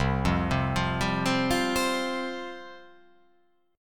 C Suspended 4th C

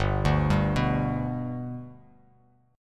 G Major G down, chord; G arpeggiated; JGuitar

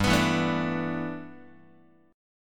G 6th Add 9th G, Guitar; G6add9 strummed

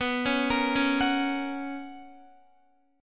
B Major 7th Suspended 2nd Guitar, chord; BM7sus2; arpeggiated; JGuitar

